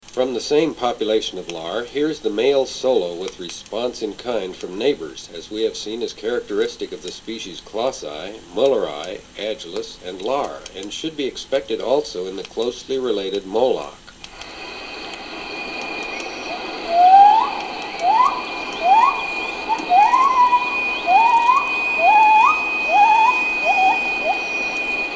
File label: H. Lar male Northern race Thailand